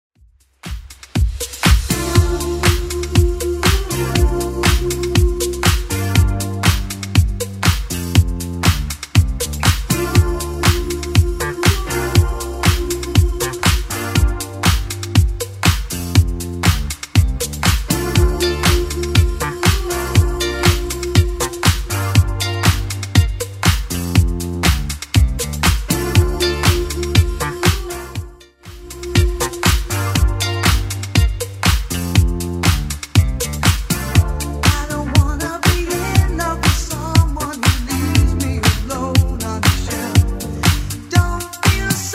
80s Club Edit 120bpm

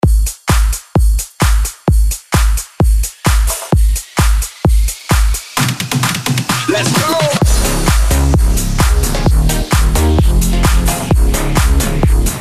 breaker 130 bpm

